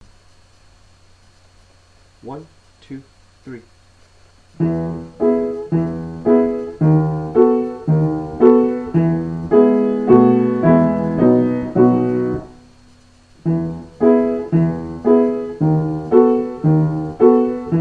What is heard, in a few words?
chopin flute variations theme piano only